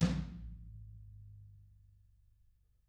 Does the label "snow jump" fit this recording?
No